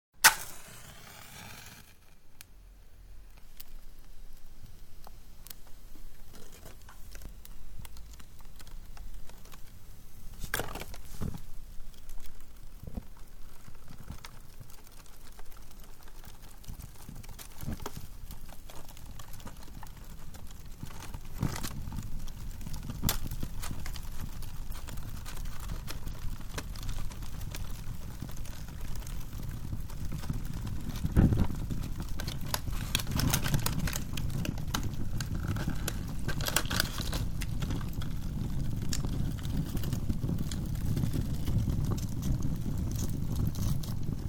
Lighting A Fire, Striking a match and lighting an open fire.; Fireplaces; Household